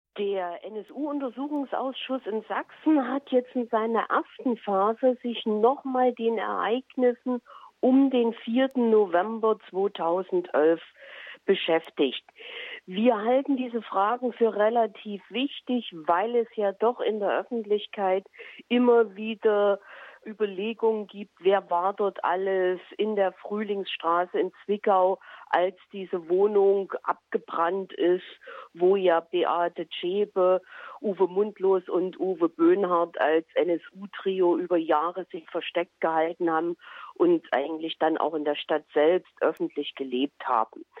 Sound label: trio; koeditz